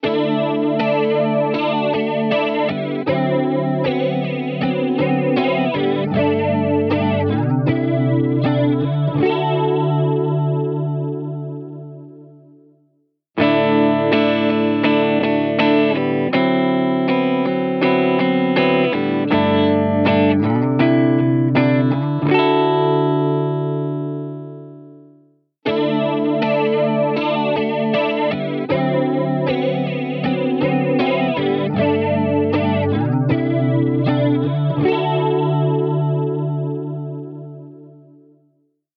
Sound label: Guitar Instant Flanger; Instant Ra Man; H90